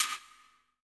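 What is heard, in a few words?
percussion, hip hop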